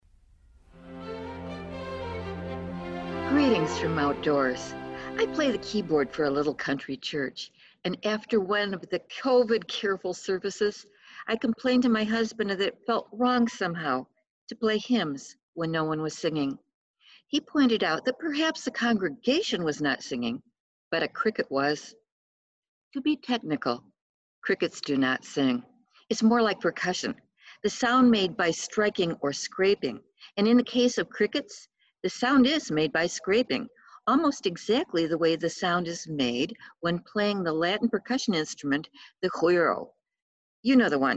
cricket percussion

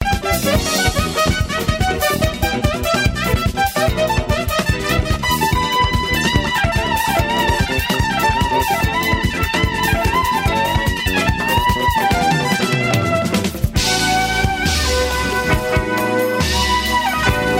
jazz

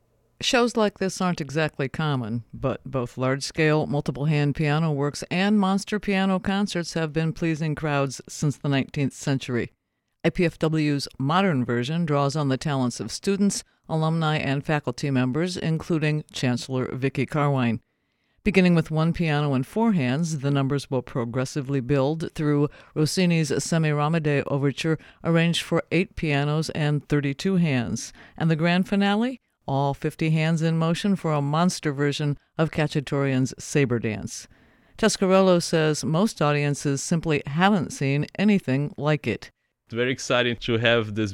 PIANO

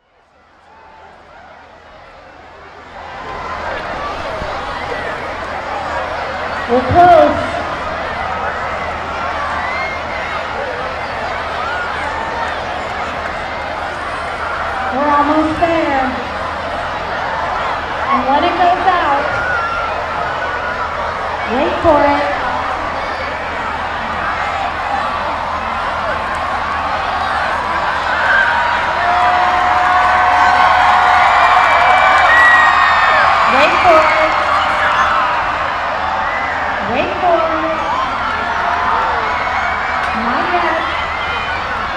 crowd cheering as totality happens

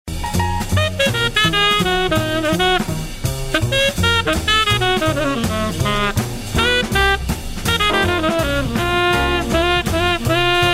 jazz

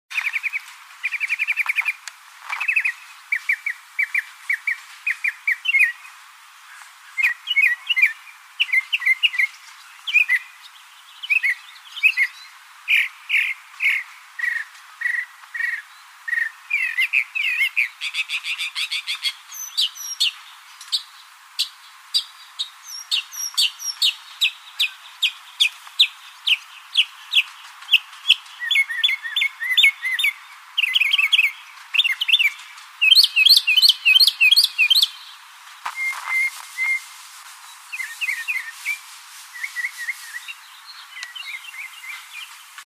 mockingbird song

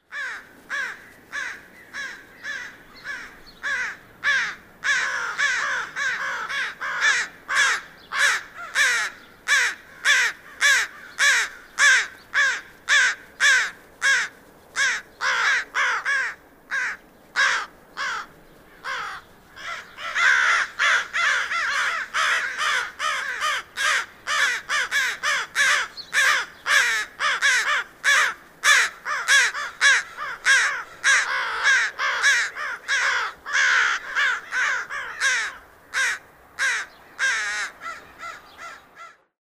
american crow; paul marvin

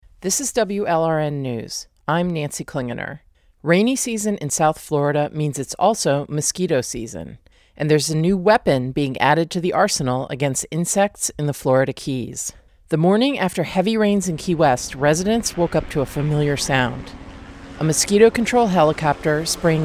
skeeter drone

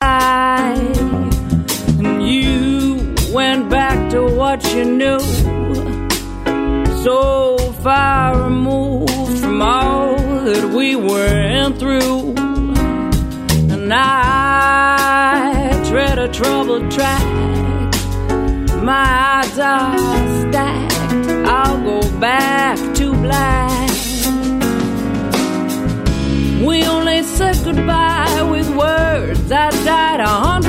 jazz

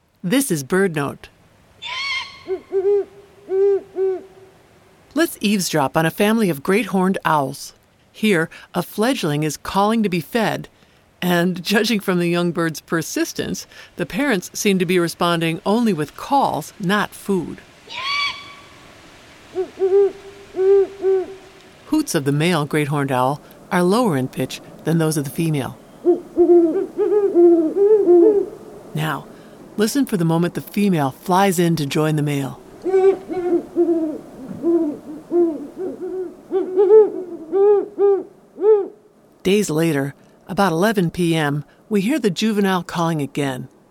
Great Horned Owls Calling

